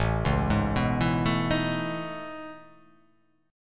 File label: A Major Sharp 11th